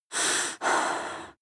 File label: breath female deep